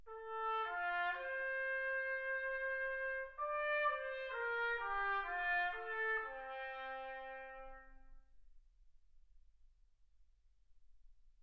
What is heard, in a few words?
trumpet